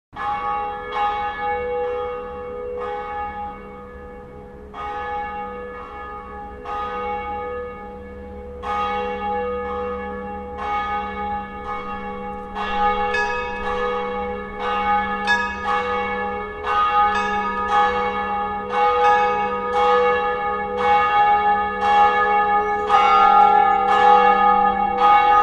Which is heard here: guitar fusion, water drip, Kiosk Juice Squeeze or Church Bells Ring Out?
Church Bells Ring Out